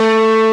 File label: A-2, GUITAR; AKAI S-Series CD-ROM Sound Library VOL-8